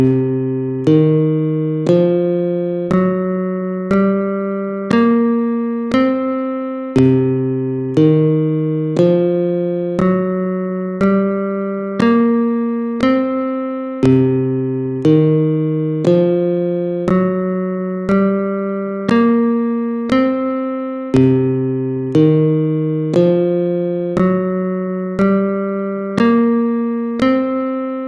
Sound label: C Blues Scale